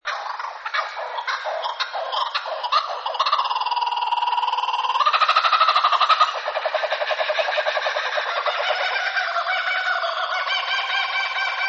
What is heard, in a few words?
Kookaburra